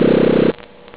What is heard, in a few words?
mellow b weep